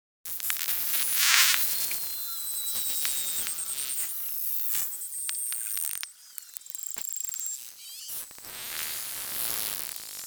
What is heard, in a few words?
Whale Beluga Clicks